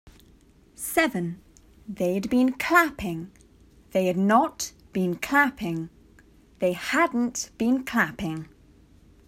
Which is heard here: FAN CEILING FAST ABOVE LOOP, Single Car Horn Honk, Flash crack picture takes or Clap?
Clap